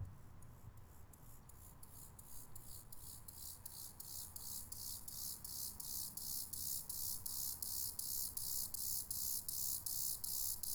chorthippus mollis ignifer, orthoptera; Minachberg; MSehnal

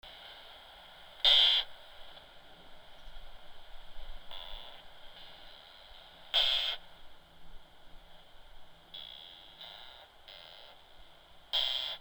Isophya modestior, Thermenlinie

